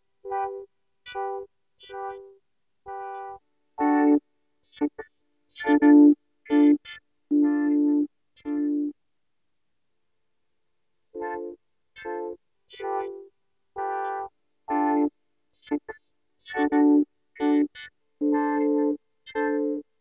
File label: Vocorder; "Count 1 to 10 spoken" synthese input: synthesizer chords, vocoder pure; flame2